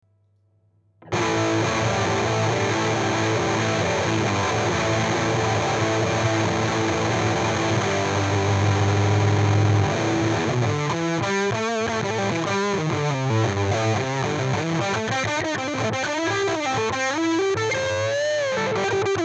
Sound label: Muff Fuzz